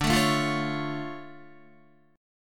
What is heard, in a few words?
D Minor Major 7th D down Guitar; DmM7 strummed